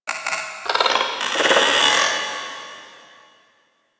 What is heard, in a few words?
Guild Robotic Chatter; Ambience; Warframe